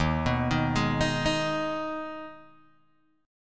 Eb Suspended 4th Sharp 5th, Guitar, Open G

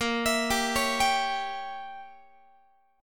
A sharp Diminished 7th Guitar, chord; A#dim7; arpeggiated; x,18,19,17,17,18

